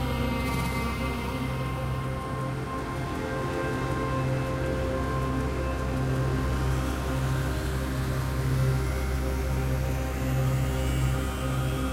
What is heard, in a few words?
Tension; Mirrors Edge